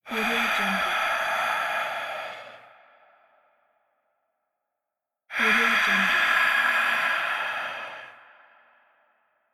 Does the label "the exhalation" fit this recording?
Yes